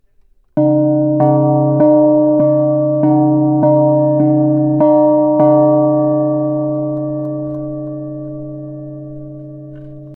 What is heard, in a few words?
Gong